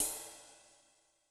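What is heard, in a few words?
Ride Sizzle, Cymbal; Yamaha DTXpress-II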